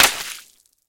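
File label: sound of a fishing float falling into water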